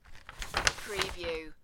paper movement

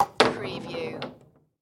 Foosball Table Insert

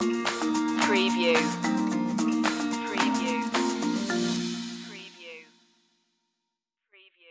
ride along stinger

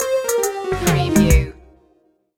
retro video game blues stinger

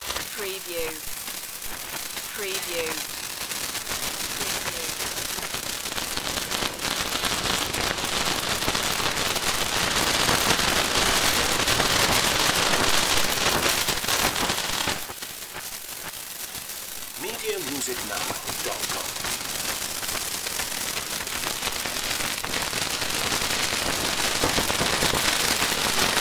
electric sizzle ambience

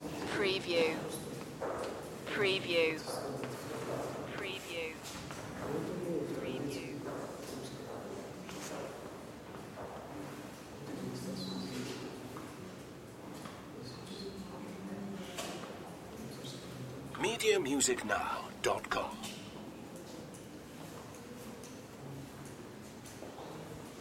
kremlin church ambience

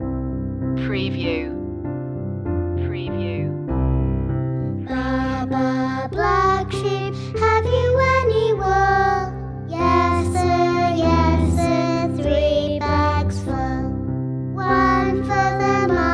baa baa black sheep children vocals short

